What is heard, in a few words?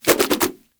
nunchuck spin whoosh; Warframe; Infested; weapons